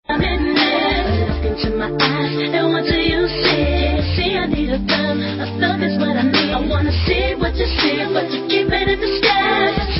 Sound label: Midi Sequence